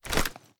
raise first raise, reloads; gmod; iw8

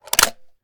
reload magout, weapons; gmod; viper

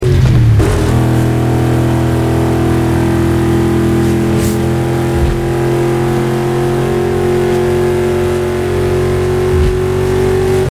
fourth cruise loop, vehicles

